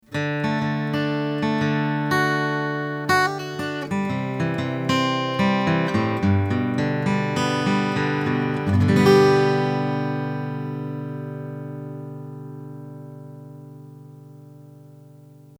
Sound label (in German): A Git Pick Beyer201